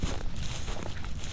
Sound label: Footsteps on grass; creatures; biped; mono; sasquatch